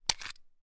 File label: gun bersa; still north media